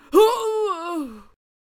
Death Vocals

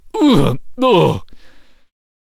Death Vocals

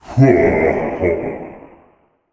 Reaper Attack Vocals

